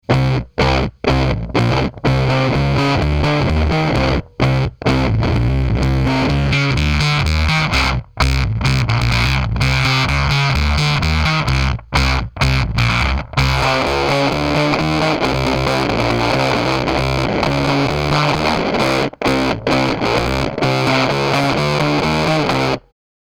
Bass War Bass Fuzz 9 o'clock Mix Max Tone Sweeping; Death By Audio; pedales efectos fuzz